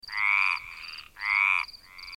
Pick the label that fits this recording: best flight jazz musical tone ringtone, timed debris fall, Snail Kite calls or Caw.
Caw